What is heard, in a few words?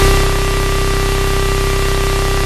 Basses Root C; Vengeance Samples